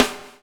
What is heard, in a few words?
BUBBLE; Spectrasonics - Backbeat